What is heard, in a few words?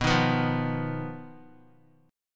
B-Major Flat 5th B down Guitar; strummed; 4F,4A,5C,5G,5C,6E; JGuitar